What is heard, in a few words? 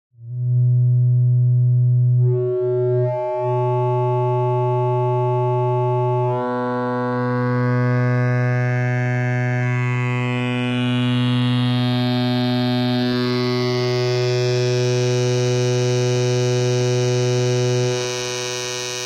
Two Sweeps, Buchla